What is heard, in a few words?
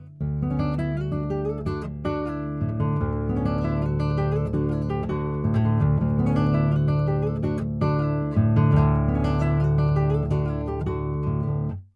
guitar; Flea 49